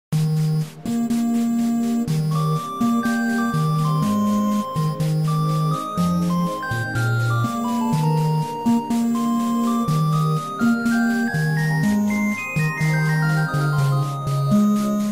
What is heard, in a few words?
8 Bit Theme; Bubblaine; Super Mario Odyssey